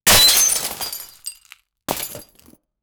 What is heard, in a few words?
glass shatering under boo; impact